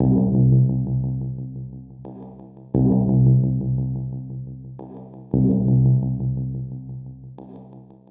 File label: SCI-FI